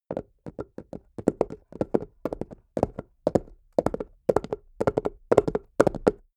horse hooves clack trot asphalt start

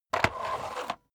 vacuum sweep back

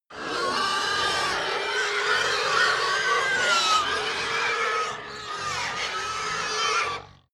pig herd grunt scream many, pig

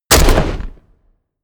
shot

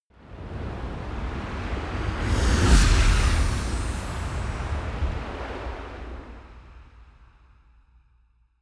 whoosh magic gust

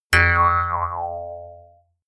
mouth harp

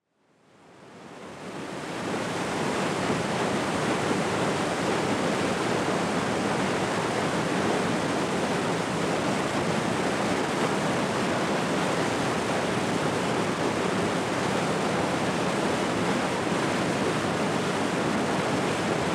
waterfall

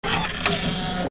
machine scream

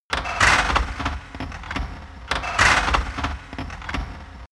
industry machine mechanic

